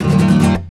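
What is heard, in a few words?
RASGAND E major, FLAMENCO Guitars; Spectrasonics; Hans Zimmer Guitars VOL-2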